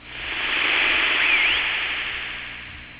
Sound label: hooting